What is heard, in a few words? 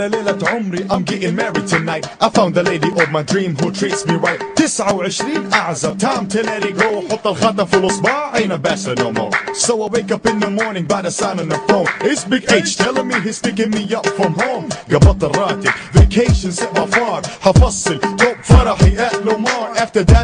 saudi wedding rap ringtone